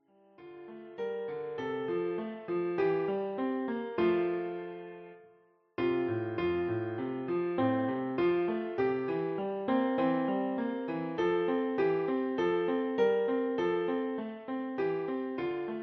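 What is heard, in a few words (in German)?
Herbei o ihr Glaeubigen, Klavier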